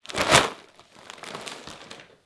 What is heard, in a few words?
Cornflakes, household rhythm samples Hits